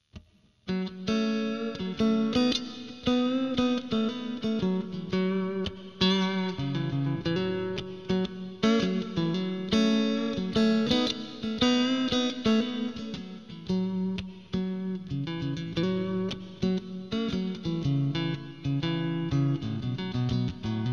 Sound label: Neck Clean Jazz, strat56